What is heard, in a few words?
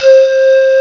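HOLLOW FLUTE; jazz jackrabbit 1; M8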